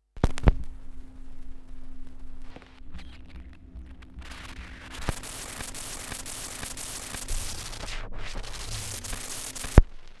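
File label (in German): Schallplattengeraeusche (78rpm), record noise; wendelrekords 1.2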